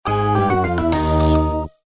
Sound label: Lose; RandomGFGoStop